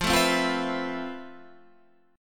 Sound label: F Major Sharp 11th, Guitar, Standard; strummed; JGuitar